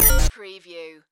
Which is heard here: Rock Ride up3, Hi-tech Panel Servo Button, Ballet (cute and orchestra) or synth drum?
Hi-tech Panel Servo Button